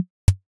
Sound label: car enter; vehicles